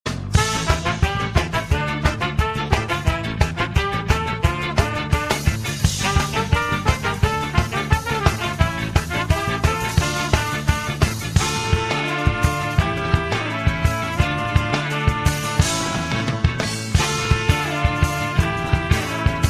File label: tetris ska version